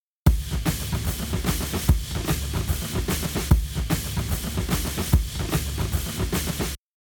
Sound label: Drums; shuffle drums; Bus 1; WDR2